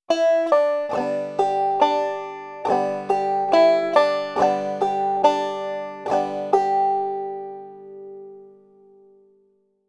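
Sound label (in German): pull off und bum ditty, banjo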